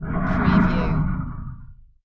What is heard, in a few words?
Alien Snarl Creature; sound effects bible